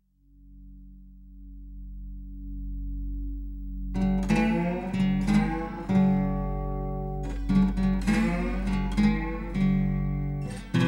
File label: Country Guitar